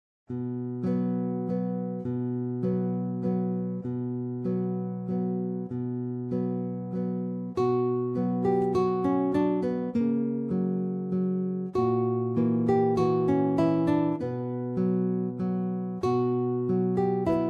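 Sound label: guitar, waltz B minor, loose; Chopin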